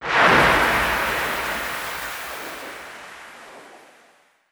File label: screen fill; Hollow Knight; gg shadelord